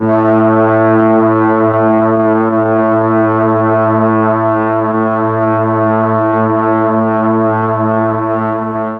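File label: A1 half speed brass, mellotron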